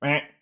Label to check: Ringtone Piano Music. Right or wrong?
Wrong